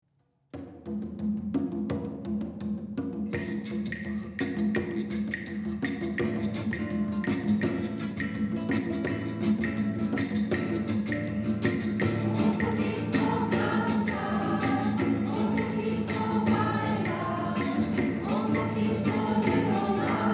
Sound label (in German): chor, adventskonzert; adventskonzert im ernst reuter saal